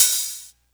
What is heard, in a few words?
huge open hi hat one shot b key